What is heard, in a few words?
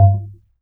E string upright bass; Larry Seyer